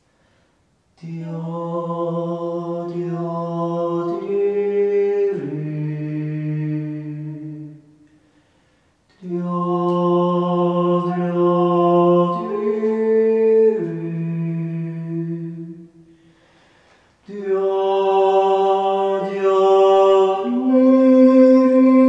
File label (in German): Andachtsjodler